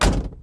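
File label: door close; Mafie 1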